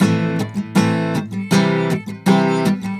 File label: AM GTR, Celtic Flavours